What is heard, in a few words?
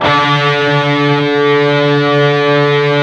PWR CHRD D#4, Guitars; PRS